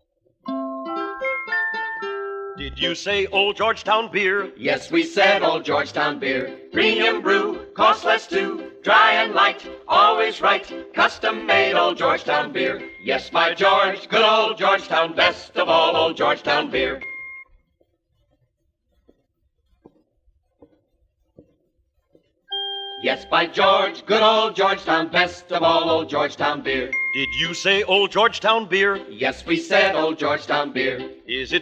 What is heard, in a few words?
Beer Jingle; Old George Town